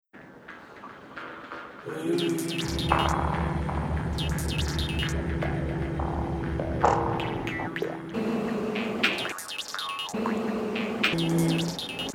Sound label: Aphasia Cant Speak with Jaw Harp Samples